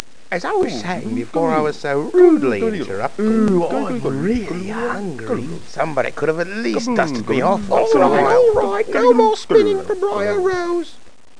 sleep crowd; Greatest Stories; slbeauty

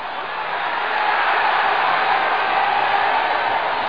CHEER; DECATHALON

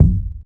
Percu; aloneinthedark4

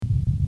Rolling, bowl; bowl blast

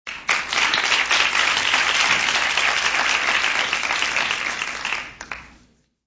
applause

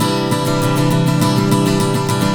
Strummed, Acoustic Guitars; ACID MUSIC

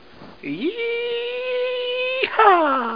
YEEHAH; Gold Medal Software Volume 1; music; digi wave

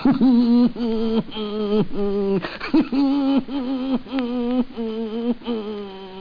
ooh

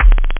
pop; da capo vol1; drums bass

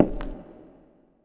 foot glass

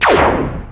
synth laser; Earth and Beyond; EA Games

